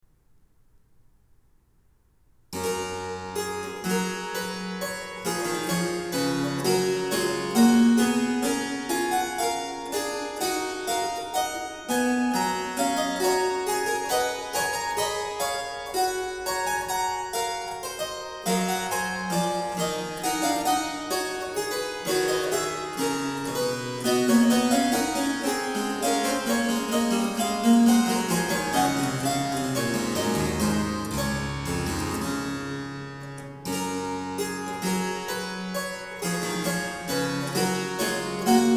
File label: Canone alla Terza, Harpsichord; Bach, J S; Goldberg Variations; BWV 988